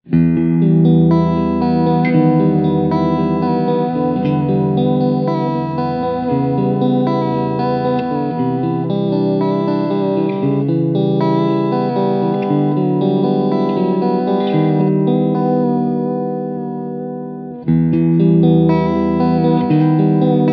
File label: Vibe Full